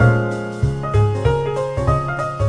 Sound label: 096 thin jazz piano bass; SAMPLED MUSIC LOOPS